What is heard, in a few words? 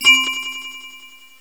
ding